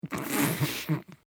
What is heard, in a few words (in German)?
vox lachen